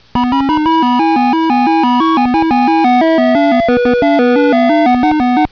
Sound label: Theme; video game sound effects; Dig Dug